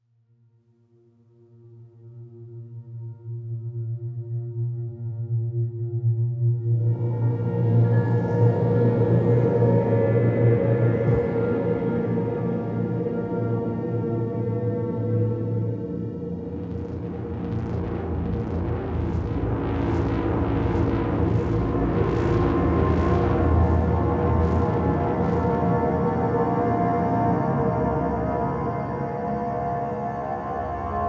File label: 74 BPM